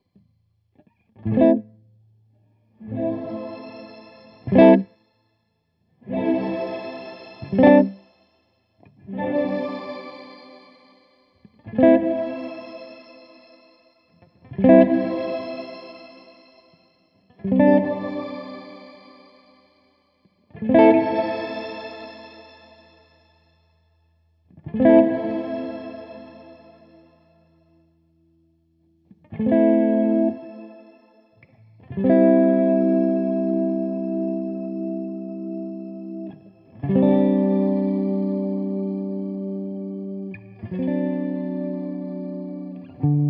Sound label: shimmer